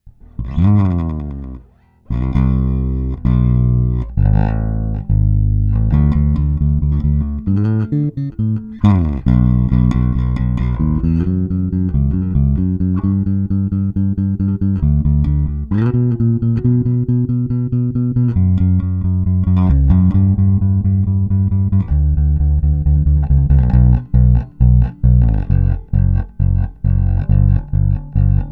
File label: onestroke bass